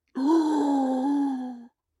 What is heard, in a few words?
Zombie Cry